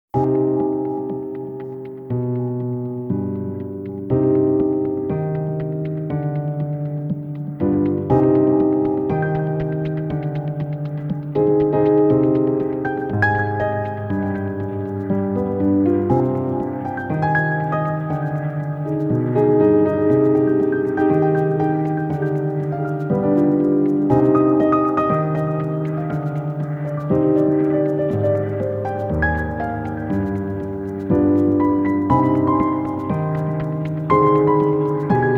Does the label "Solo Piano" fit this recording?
Yes